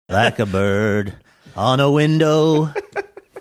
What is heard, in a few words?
singing